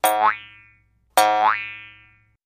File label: Jews Harp Plain Boi, boings